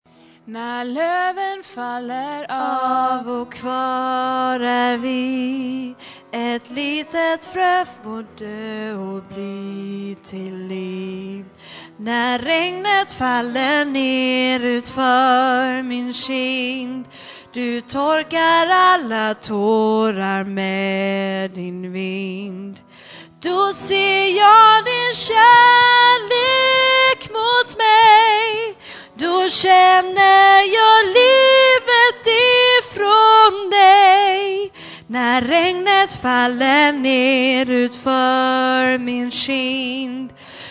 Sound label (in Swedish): sopran